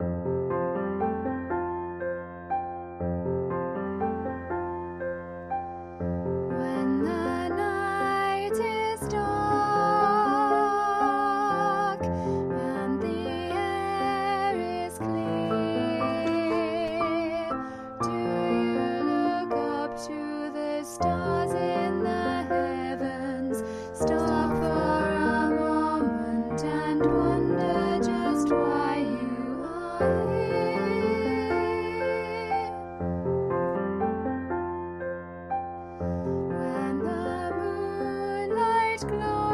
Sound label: alto, choir